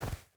footstep boots snow run; Rust

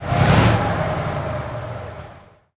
t90a engine load; engines; War Thunder

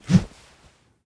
2handed mace attack; Rust

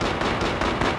50cal loop echo

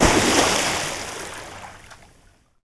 Oars Water; Civ4 warlords

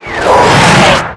damage hits explosions

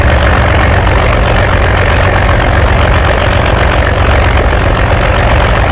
Aircrafts Engines Jumo213A; DCS

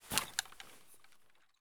pm mag pullout; Escape from Tarkov

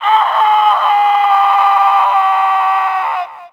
grunt fall die

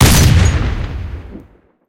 ns45 single shot, guns; War Thunder

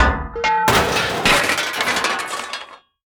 combat atmosphere; World of Tanks

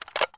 rem700 mag out; Escape from Tarkov

